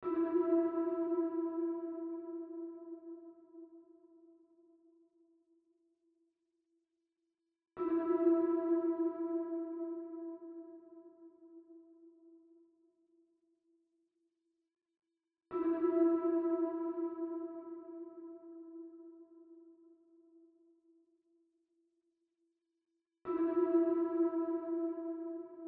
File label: 124 BPM A Min Accent; Trap Melody